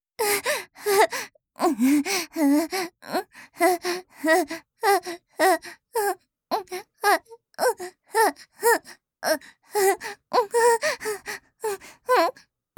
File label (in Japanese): 相手の腰にまたがり激しく腰を振る; 実用ボイス抜粋集